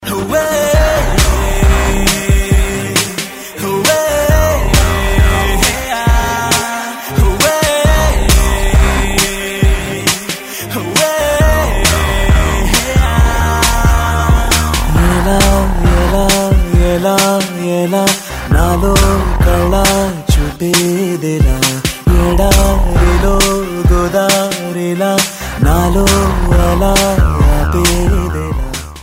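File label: Love background music, Telugu Ringtone; Pawan Kalyan; Panja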